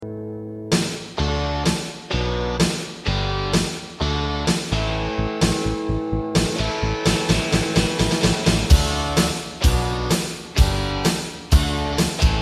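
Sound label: green revolution instrumental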